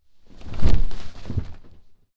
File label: clothes low hit drop